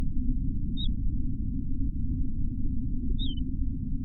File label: Little Ringed Plover